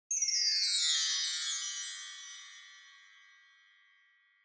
Wind Chimes Down Low

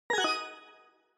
Scene Change Short Down

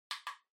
Portable CD Far Button

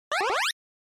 NES RPG Magic

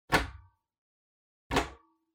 Ceiling Light Pull

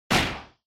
Motion Slam

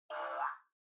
OS Sound (Speaker-Notification)

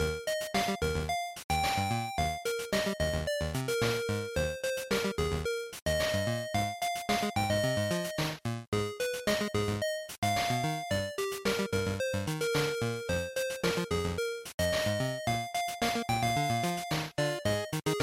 Action Title Loop110

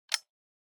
Outlet Timer Circuit Active; タイマー機器; コンセントタイマー; 回路

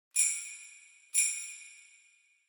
Sleigh Bells Knock Hard Short

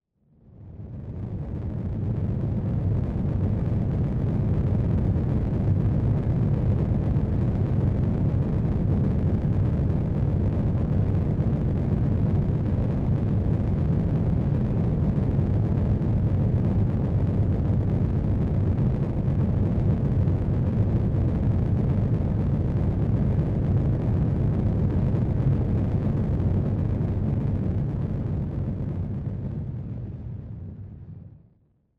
Onoma Rumble Hard

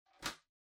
Printer Far Scan Lid Close

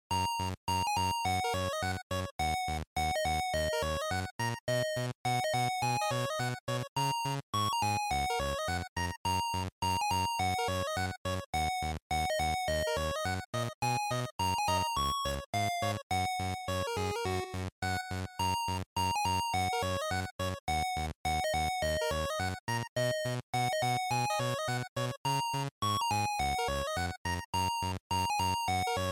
Menu, bgm

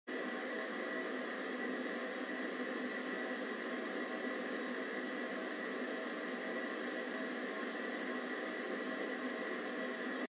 Radio Signal Noise Far AM

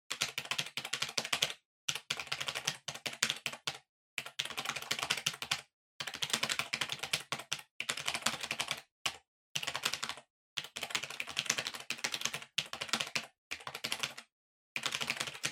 PC Keyboard Far Hard

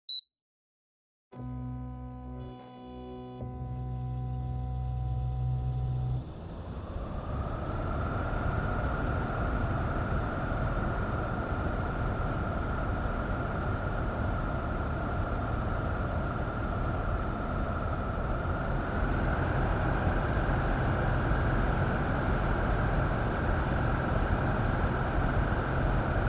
Air Conditioner All

